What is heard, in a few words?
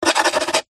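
office pen biro write sign signature on thin wood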